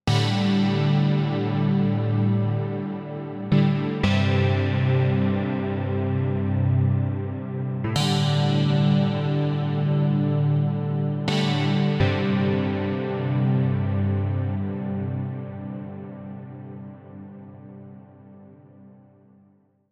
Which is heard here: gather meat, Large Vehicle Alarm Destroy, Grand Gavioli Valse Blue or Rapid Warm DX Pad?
Rapid Warm DX Pad